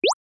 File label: Motion Pop